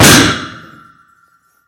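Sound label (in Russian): сильный тяжелый удар головой об железную дверь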